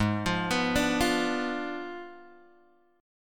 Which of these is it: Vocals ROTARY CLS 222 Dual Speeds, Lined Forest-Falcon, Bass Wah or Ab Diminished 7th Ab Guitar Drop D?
Ab Diminished 7th Ab Guitar Drop D